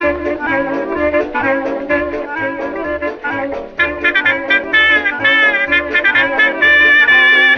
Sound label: horns, loops